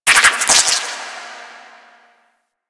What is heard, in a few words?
Ocean Underwater Plant Chatter; Ambience; Warframe; Grineer Ocean